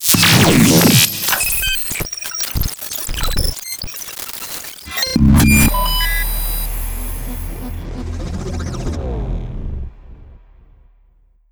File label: Hacking Sfx Bed